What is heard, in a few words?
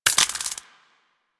Modular Clip Large Mech, Weapons; Warframe; SU Modular Guns